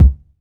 short kick single hit C key, kick drum single shot tuned to the C note